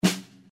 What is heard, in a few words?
Single Snare